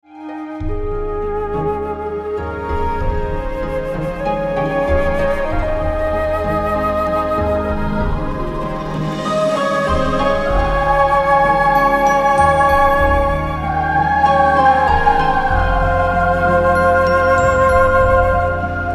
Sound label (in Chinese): 伤感的背景音乐